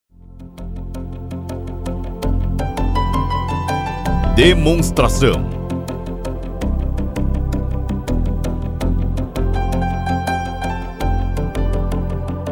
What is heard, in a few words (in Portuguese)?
Trilha